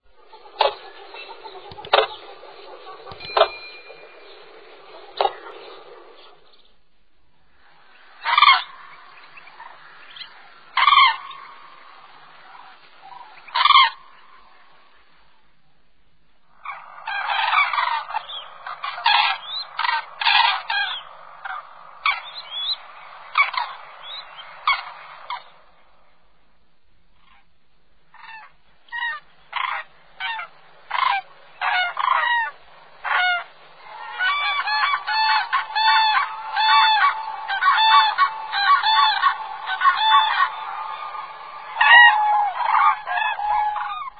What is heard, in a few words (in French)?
Chant de la grue cendree crane song